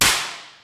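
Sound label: physics clipboard impact large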